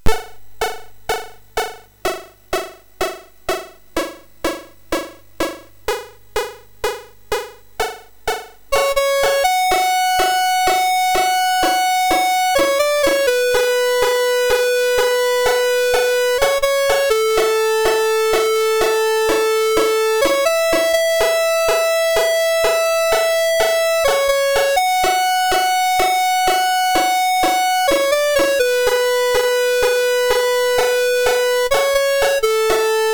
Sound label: sid MOS6581R3, GAMES; Continental Circus